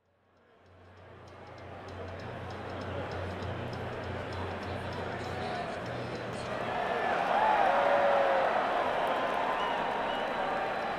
Crowd; Philadelphia, PA - Mann Center for the Performing Arts

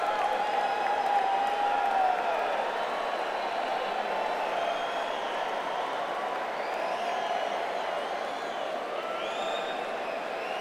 Crowd; Noblesville, IN; Verizon Wireless Music Center

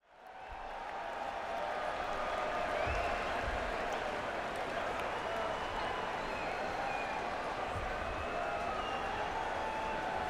Crowd, Madison Square Garden; New York, NY

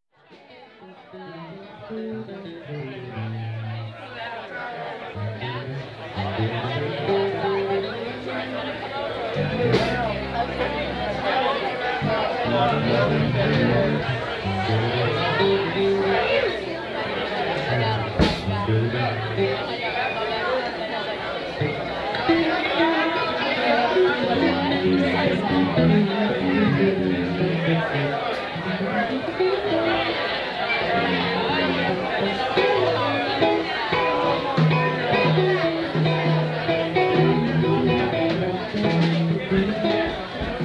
Tuning; Burlington, VT; Nectars

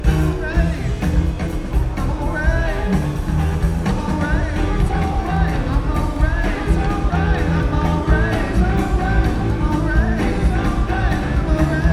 Blues; San Francisco, CA - Bill Graham Civic Auditorium

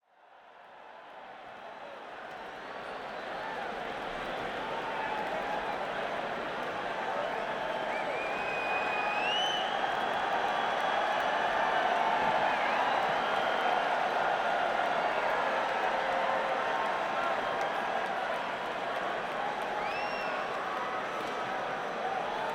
Crowd, Madison Square Garden; New York, NY

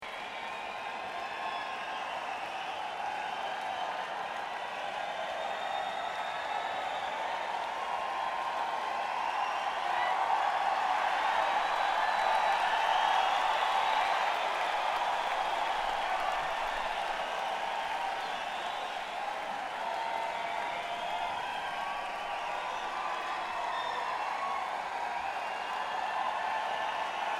Crowd; Phoenix, AZ; Desert Sky Pavilion

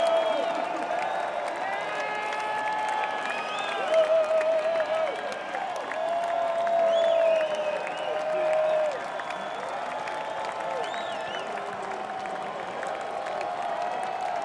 Encore Break; Chula Vista, CA - Sleep Train Amphitheatre

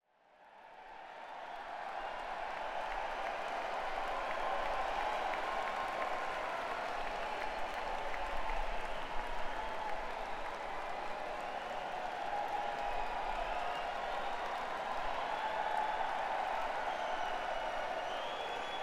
Crowd; Noblesville, IN - Ruoff Music Center

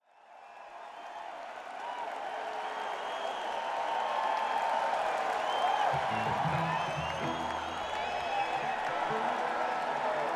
Tuning; Saint Paul, MN - Xcel Energy Center

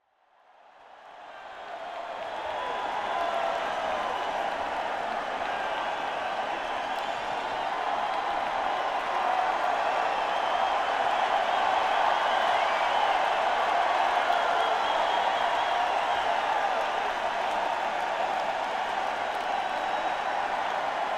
Crowd, Madison Square Garden; New York, NY

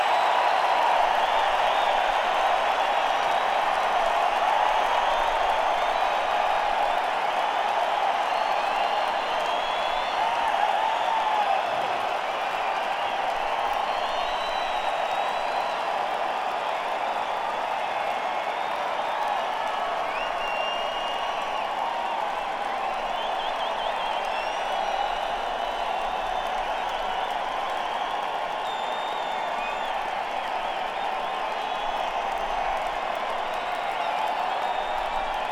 Crowd; Denver, CO; Pepsi Center

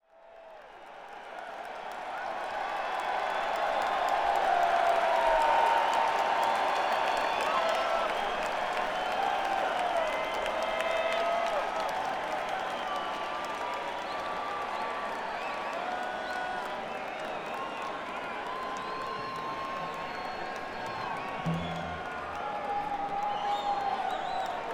Crowd; Inglewood, CA - The Forum

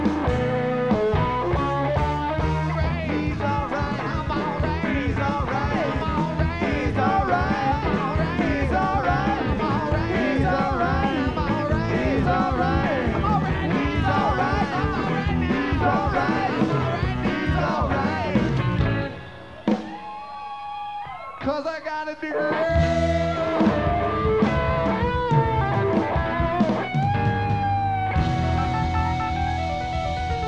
Alumni Blues; Crested Butte, CO; El Dorado Cafe

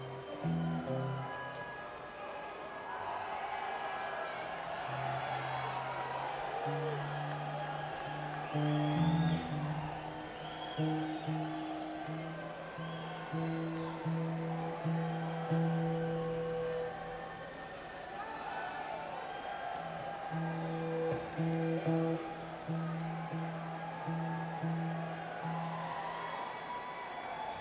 Tuning; Mansfield, MA; Comcast Center

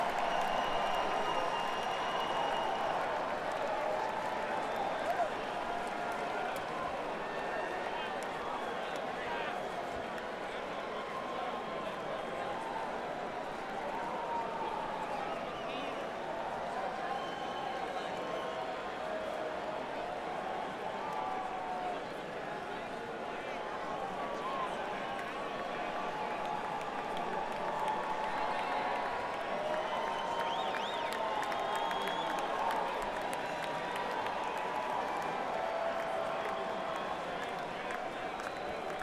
Encore Break; Portsmouth, VA - nTelos Pavilion

